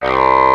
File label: Brush Oh; Mo' Phatt; Digital Sound Factory